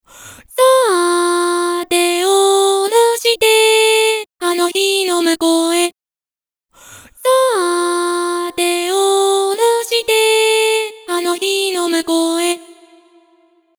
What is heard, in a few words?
Neoverb, vocaloid